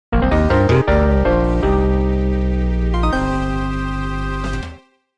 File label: jingle